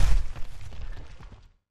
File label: debris impact large, rock sand